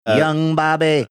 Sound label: singing young bobby